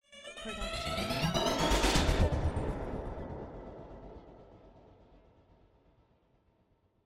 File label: Rhythmic Rise; soundscrate